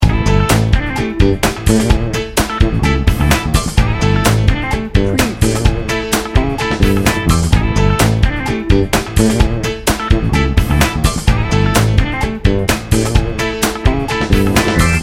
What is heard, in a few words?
rocker funk loop